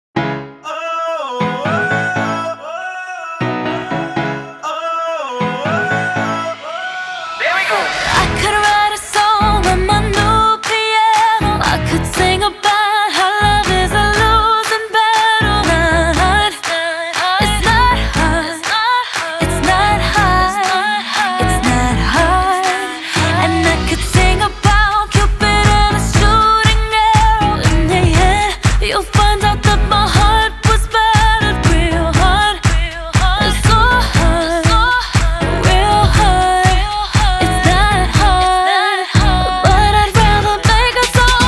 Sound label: PIANO LATIN SHOWDANCE; JUNIOR B; junior b medley team